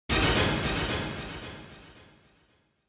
Quiz Question Low Delay; クイズ 出題02-2(低 ディレイ)

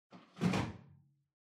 Washer Front Load Far Filter Upper Insert Strong

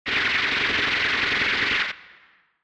Retro Anime Gun Mid

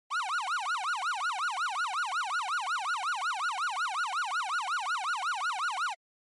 Police Car Siren Dry

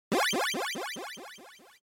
Shooter Item

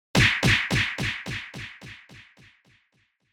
Hit Punch High Delay

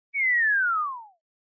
Motion Falling Whistle Fast Long

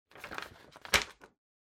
Flyer Rustle

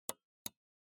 PC Mouse

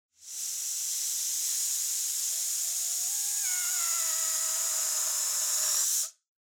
Balloon Deflate

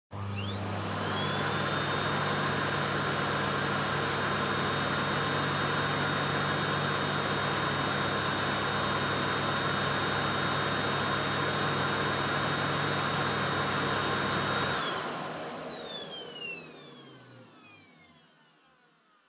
Vacuum Cleaner Vacuum Floor Soft Mid Still

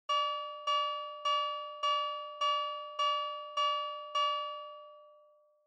Fire Truck Siren Dry

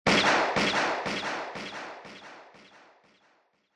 Gunshot

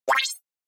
Cyber

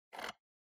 PC Mouse Scroll

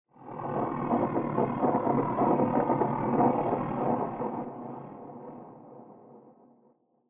Horror Accent Low Long

